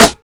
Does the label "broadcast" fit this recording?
No